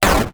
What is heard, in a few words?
crash; arab desert